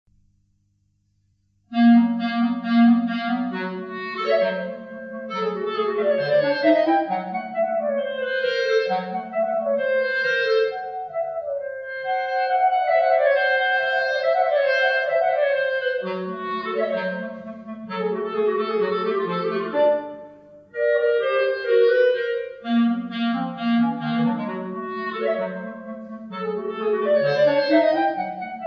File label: divertimenti 2 clarinets; 5 divertimenti